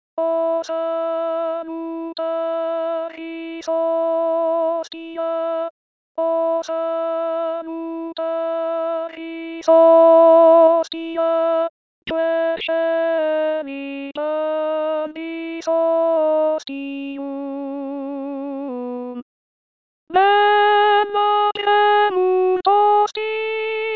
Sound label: O Salutaris Alti; Liszt O Salutaris